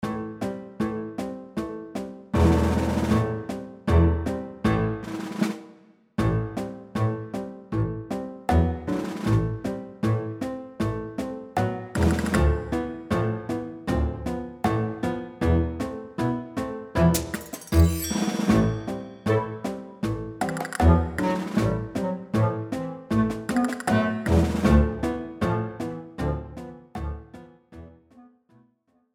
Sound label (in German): Quietsch, Floetentoene; Jede Menge Floetentoene 1